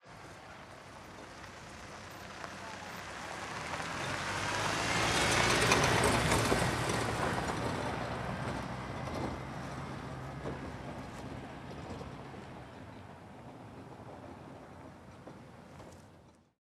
Van de Passageiros Passando em Estrada de Terra; transito

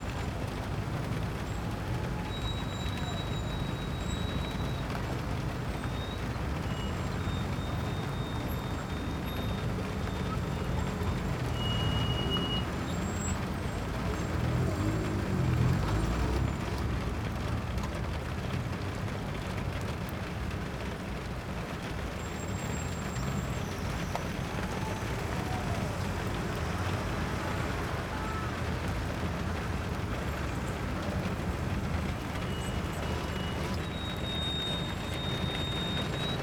Ambiencia chuva no parabrisa dentro do carro rua com transito, pista molha; pista molhada, musica

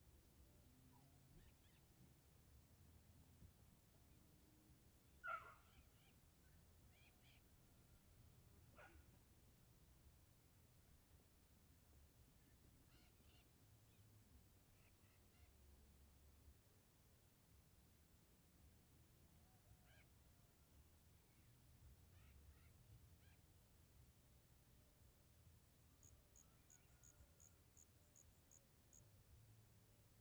Ambiencia dentro da mata fim de tarde com cachorro, passaros e grilo que a; grilo que aparece

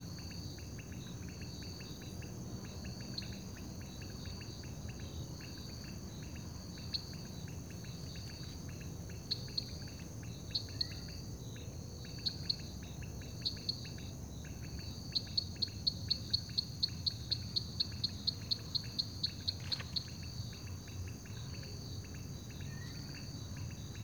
Grilo diferente com sapos, outros grilos, cigarras e transito longe; Cerrado; insetos; colecao sonora do cerrado

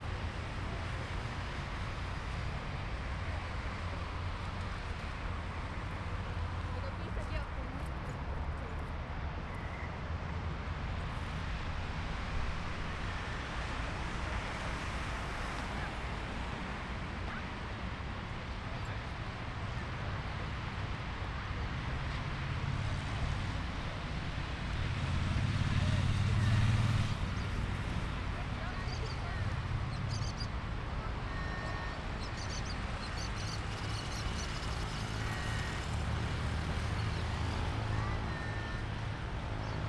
Transito com poucas pessoas; Coleção Sonora do Cerrado

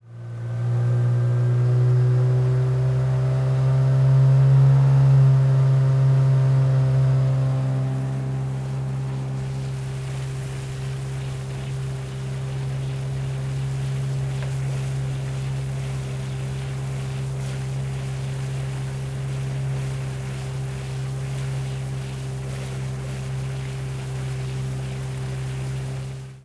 Motor de lancha com agua batendo na proa

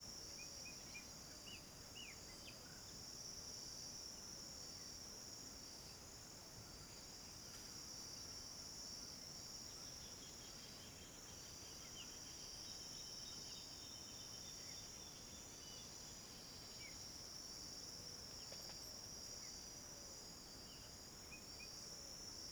Coro de grilos em fim de tarde com alguns passaros; Cerrado; insetos; colecao sonora do cerrado

